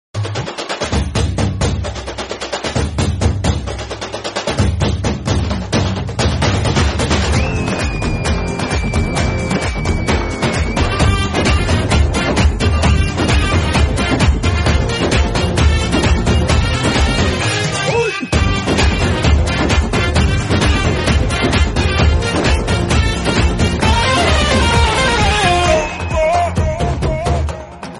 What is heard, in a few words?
Tamil BGM Instrumental Tone; Temper; Tamil Movie